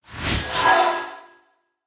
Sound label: Enemy Healer Cast